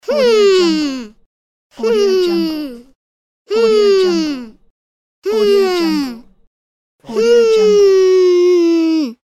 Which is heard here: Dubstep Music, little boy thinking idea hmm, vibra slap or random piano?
little boy thinking idea hmm